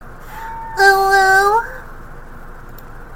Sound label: uwu